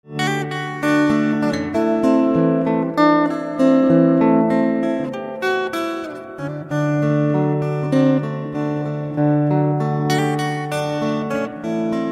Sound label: Guitar Slow, instrumental ringtones